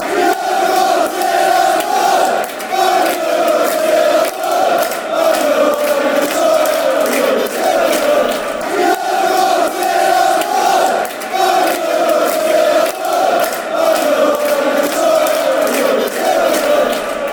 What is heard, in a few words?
yeovil till i die fanchants